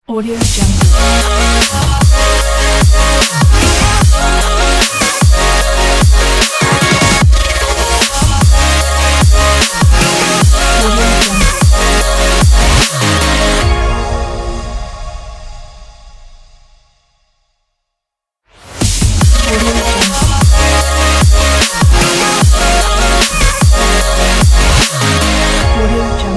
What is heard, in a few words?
future bass logo; add9audio